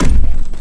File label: Porte Kick